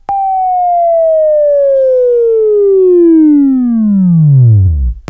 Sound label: Buzz, Sweeping Training